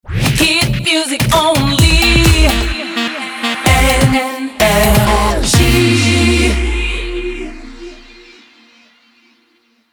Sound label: Jingle Shotgun; NRJ